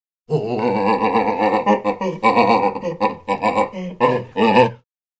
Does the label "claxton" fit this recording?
No